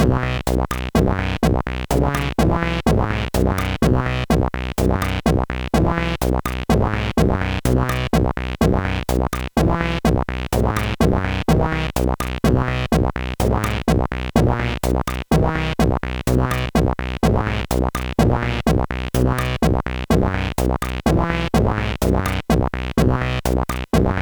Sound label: Frog Dance, sid CSG8580R5